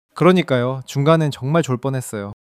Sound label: laughter